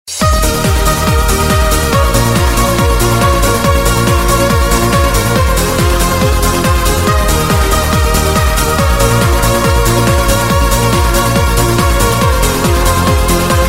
cold funk ringtone

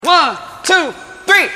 sms ringtone

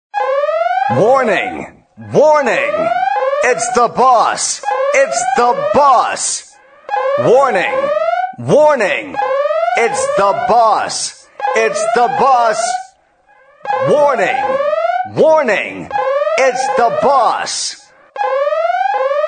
boss alert ringtone

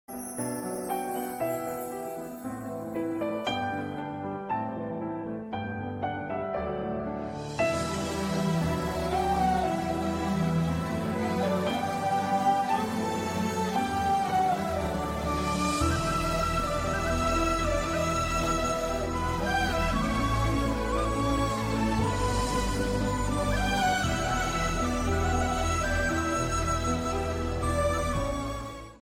telugu ringtone

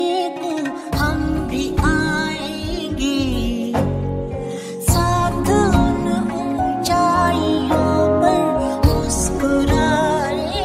bollywood ringtones

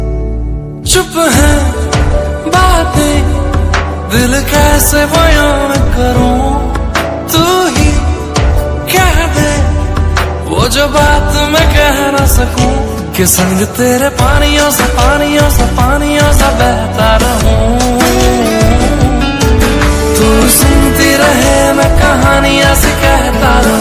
bollywood ringtone

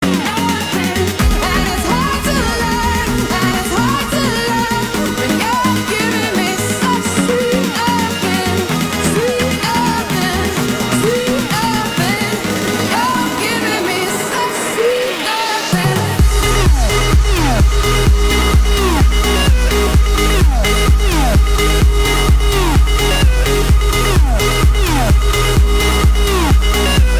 english song tone ringtone, english ringtones

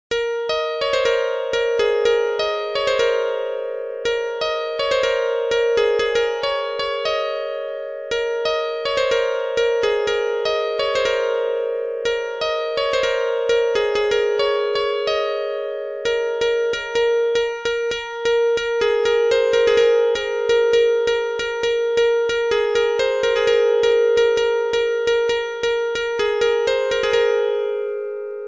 love flute ringtone; oru adaar love

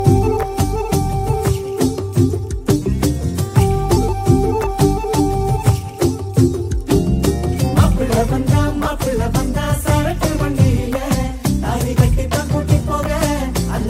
tamil song ringtone

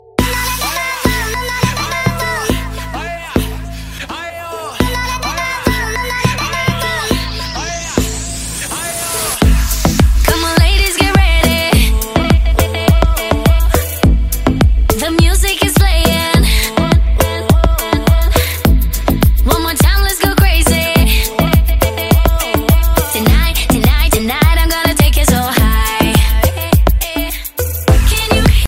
arabic ringtone

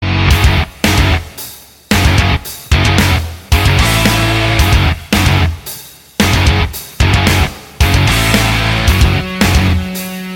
iphone ringtone; high octane

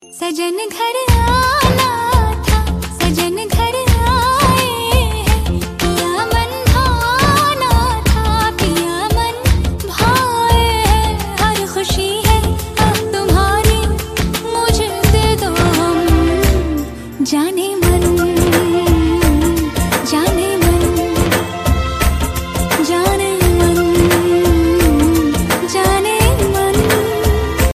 ringtone

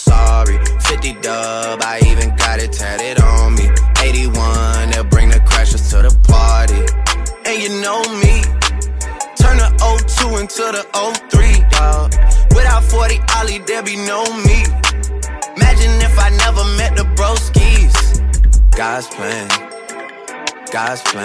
english lovly music ringtone

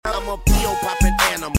pill popping up ringtone

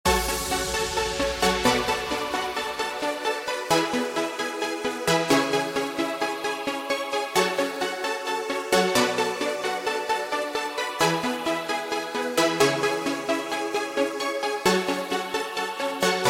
party tune ringtone

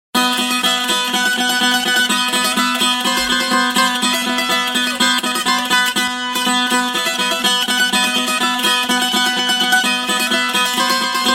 tambura mandolin ringtone

